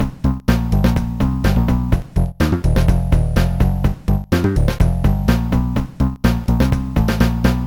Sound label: rythm bass; Hein; Trackers; Modland